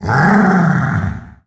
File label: Bowser Growl; Galaxy